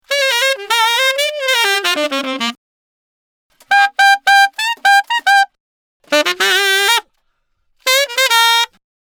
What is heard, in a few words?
Tenor Sax Solo